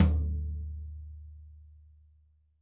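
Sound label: floor tom; drum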